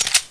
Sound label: fiveseven slide release, weapons; Counter-Strike Global Offensive